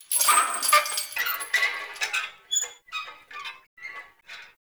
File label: Path Lantern Impact Swing, Hanging Lanterns; Dendrit